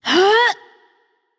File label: grunt lift light; smite; voicepack; hel